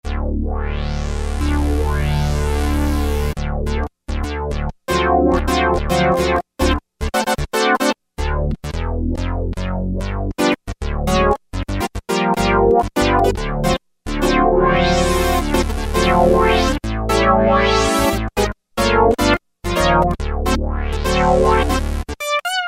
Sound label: Funk, Roland Juno-60; Factory presets